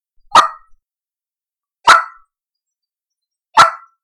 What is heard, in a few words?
Turkey Cluck; ORION